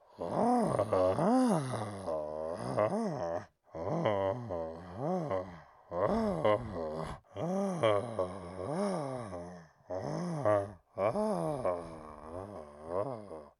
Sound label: dazed loop